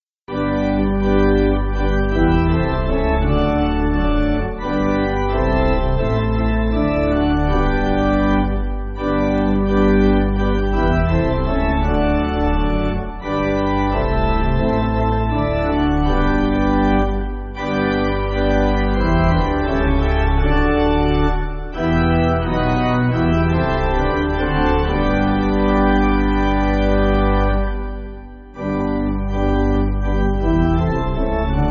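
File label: Pipe, Pipe Organ, Music Backing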